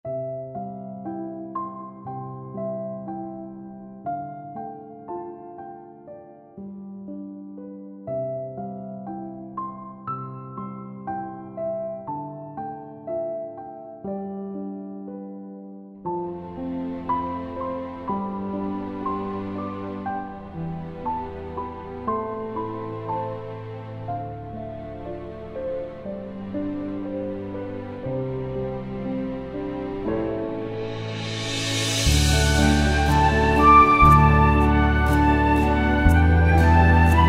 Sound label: plants instrumental song